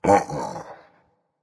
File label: disagree berserker; cuchulainn